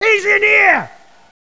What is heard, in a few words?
joker laugh; hidden-mod